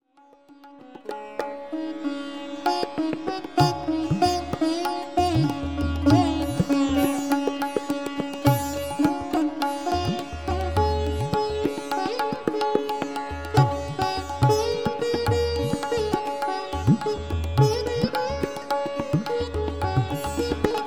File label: Dhun